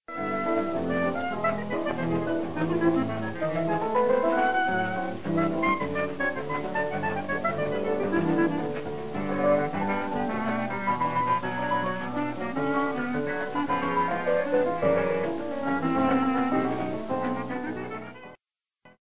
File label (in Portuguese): Cabuloso saxofone, clarinete; Excerto musical